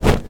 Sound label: cloth Hit; twinStickCrawler